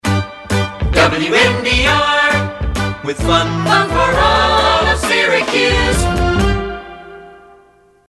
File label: All The Best Commercials; WNDR; PAMS